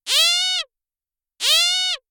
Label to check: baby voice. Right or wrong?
Right